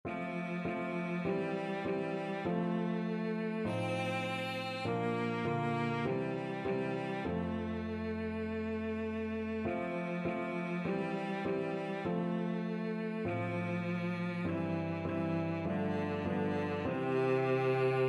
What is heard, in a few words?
river glorious, cello